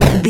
retro impact hit general

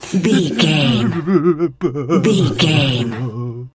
voice male cry whimper

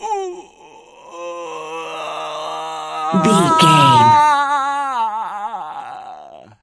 voice male death torture

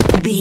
footstep dirt land

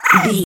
goblin fairy hurt pain

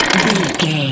gun auto barrel spin slow stop

